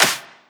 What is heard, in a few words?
Clap; osu